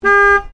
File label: Car Ford Focus Horn Long